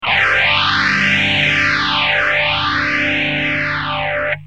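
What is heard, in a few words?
guitar, Morley deluxe phaser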